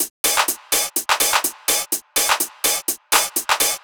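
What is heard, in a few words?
No bass drum, Drum Loops 125bpm, Nu Dance Electro; Chicago Vibe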